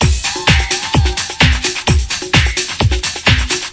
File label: euro fem vox and whi, drum loops and breaks